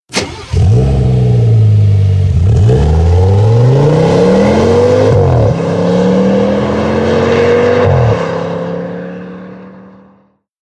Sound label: Audi Rs6